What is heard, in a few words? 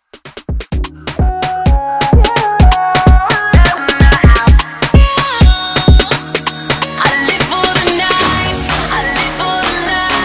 Afrobeat Redrum